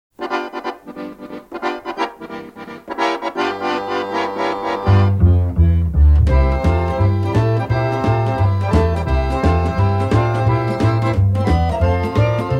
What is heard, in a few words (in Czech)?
akordeonove boogie